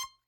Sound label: Hohner Special20 F Vib rel C5, Harmonica; Aerophones; Releases; Free Aerophones